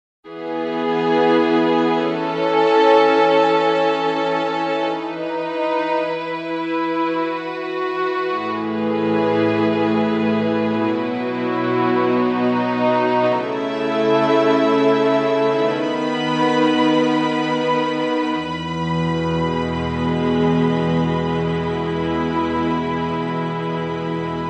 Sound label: Slow Strings